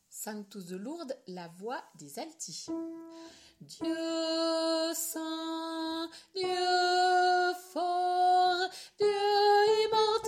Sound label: sanctus de Lourdes Alti